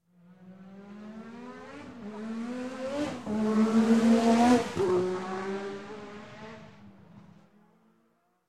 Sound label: Formula 1 Race; Sound Ideas; Series 2000